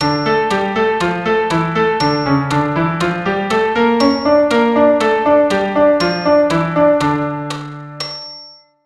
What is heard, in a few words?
Dm harmonic